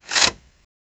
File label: robotic arm deploys